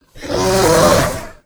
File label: Hungry Dragon